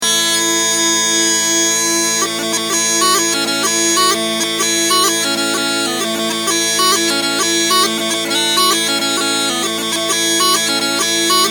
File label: Small Pipes; bagpipes; Cork Hill; Jared Malone; bagpiper